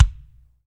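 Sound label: BD, bass drum; Best Service Gigapack 2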